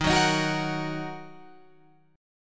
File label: D sharp Minor, Guitar, Open F; strummed; JGuitar